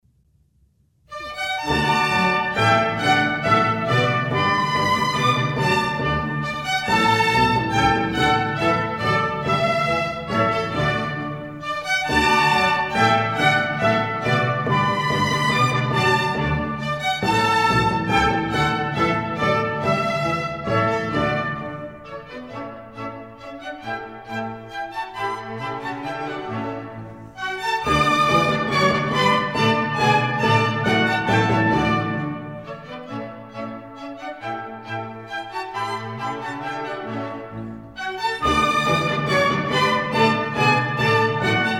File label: German Dances, D major; Mozart; Dances and Marches